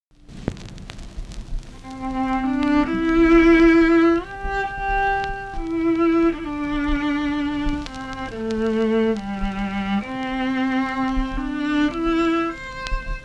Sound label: viola original